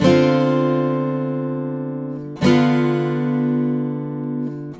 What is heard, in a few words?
Guitar D, Acoustic